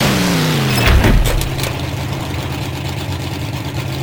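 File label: drive down, truck; vehicles; brrr truck; sentry; manster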